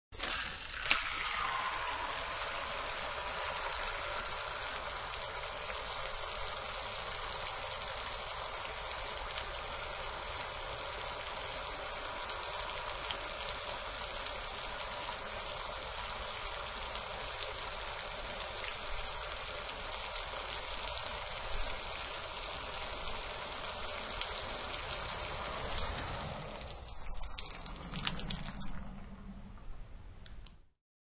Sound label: Outside Shower, Outside shower turning on, running, and turned off; Bathroom; Sound Effects:Household